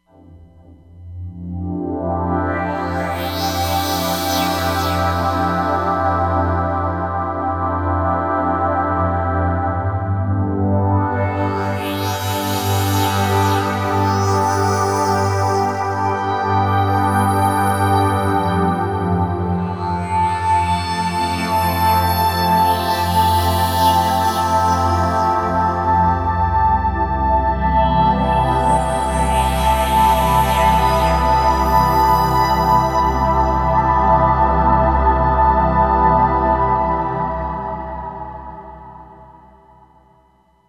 Digi-Swell

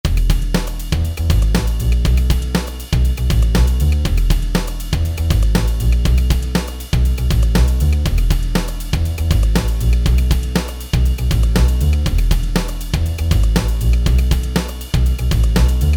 Funk

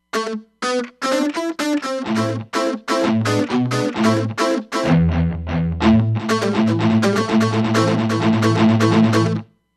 Dist Gt Mt

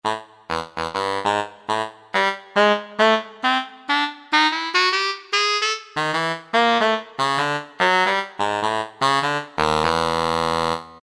Bari, Brass; Yamaha A4000; Wind Instruments

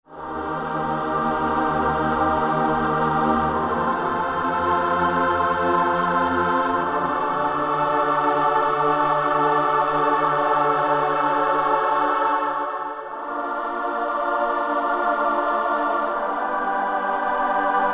Roland SRX-03 Studio SRX

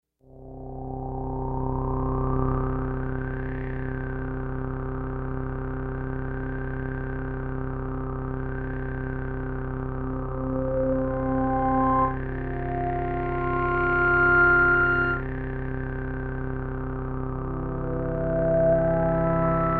Ddgeridu

